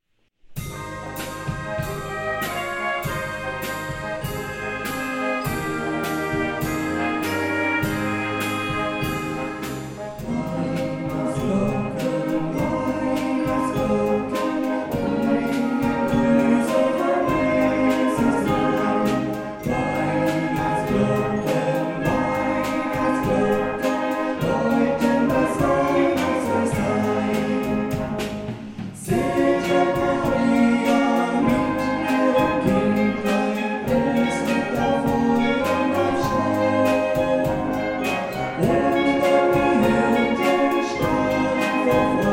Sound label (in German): Weihnachtsglocken kurz